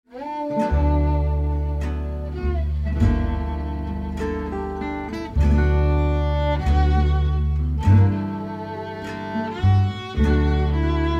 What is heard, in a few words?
gypsy jazz